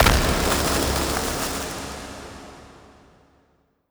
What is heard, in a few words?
weld, strider; creatures; Half Life Alyx